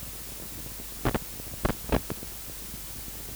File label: Tape Noise; layering